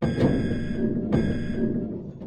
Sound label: Ambient; Pre-breach